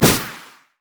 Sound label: microrockets; LoL; heimerdinger